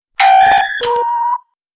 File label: Transporter Buttons